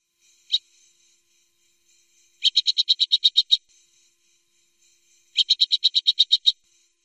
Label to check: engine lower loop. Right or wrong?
Wrong